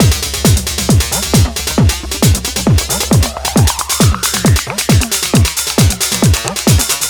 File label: House; Best Service ProSamples vol.10 - House; AKAI